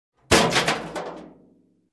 Metal Trash Can

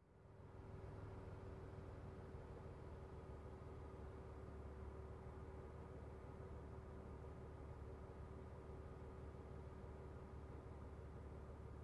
Old Car parking

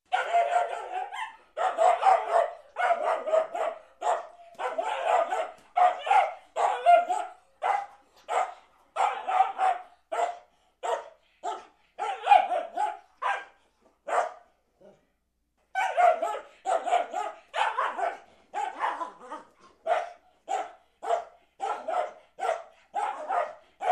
Two dogs barking at each other in garden

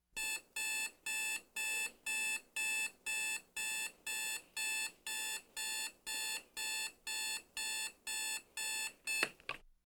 Digital Alarm clock beep turn off

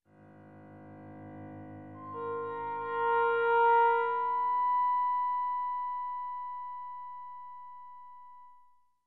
Suspenseful horror sound effect

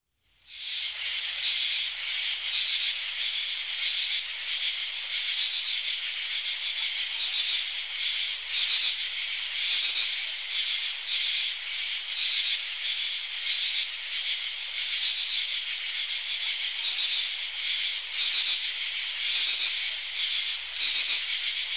Meditarranean Cicadas

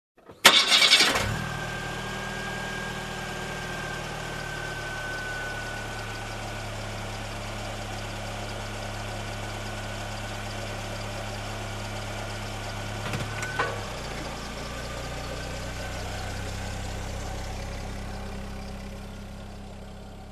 Car motor start and drive away

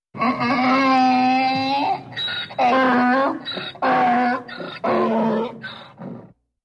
Farm donkey neighing

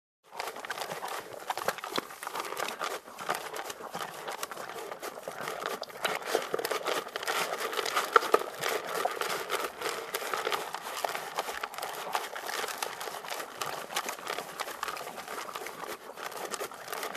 Horse Gait on wet surface

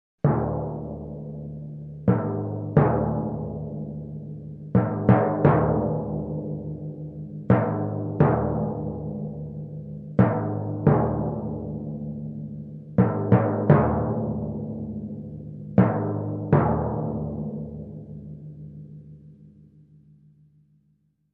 Dark and suspenseful timpani drums

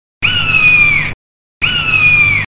Eagle scream

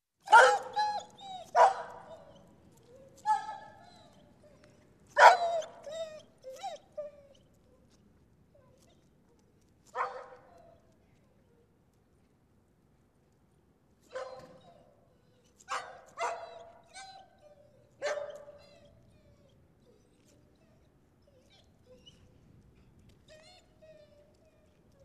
Two dogs barking and whining in backyard

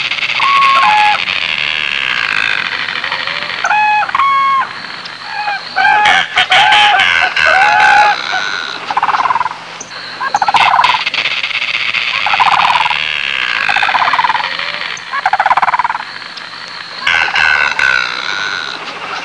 Heron flock

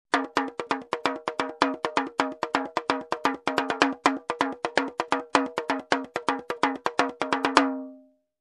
Gated Conga drum pattern

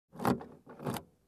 Car key put in lock

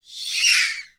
Fantasy dashing shriek

